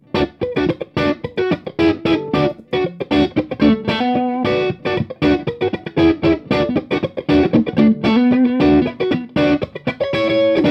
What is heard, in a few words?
true vintage funk, guitare